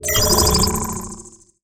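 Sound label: enemy attack scan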